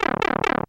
Power Down; SMW

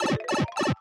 Pipe Out

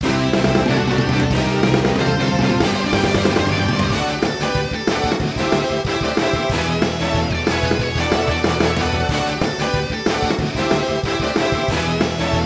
Match Victory Theme; MTO

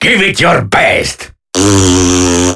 Alarm Clock (shut off)

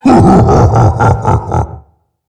Bowser Laugh; SMBW

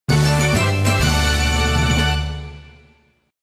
You Win!

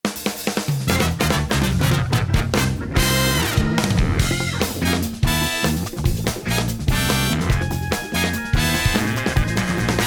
Underground BGM Arrangement; SMB2

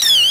Monty Mole SFX; SMK

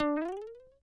Elephant Mario Jump Mid

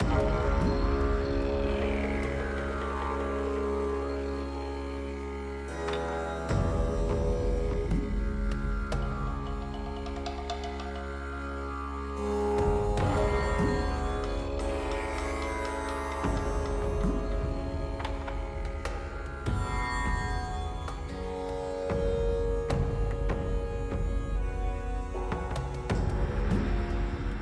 Hall of Trials; Diamond Island

